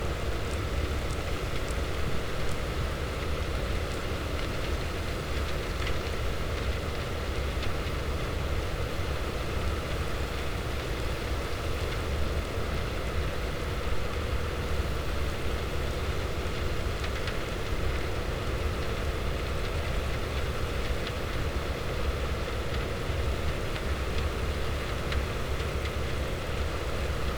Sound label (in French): cachalot positif, Physeter Macrocephalus